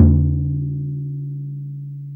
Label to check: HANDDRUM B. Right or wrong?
Right